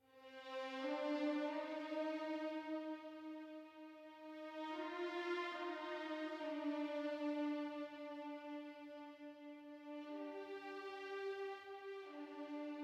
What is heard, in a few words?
Stinger Gameover Negative Stems Violins